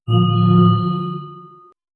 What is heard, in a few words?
modern automot, automotive